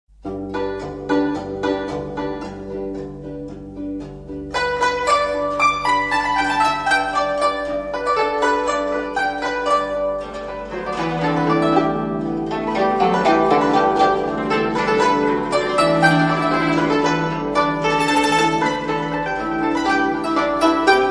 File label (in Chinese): 步步高古筝版